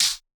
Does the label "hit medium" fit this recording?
Yes